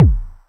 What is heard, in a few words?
KICK